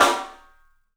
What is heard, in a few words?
PIC SNR, PICOLO ROOM; 3D Sound